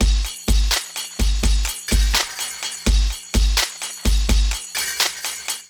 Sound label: Kit groove 84 bpm; MIDI Clips; Animals Kit groove; Drive and Glow; Ableton; Factory Packs